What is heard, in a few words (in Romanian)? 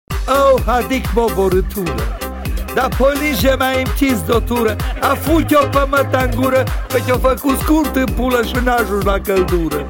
Muzica Tare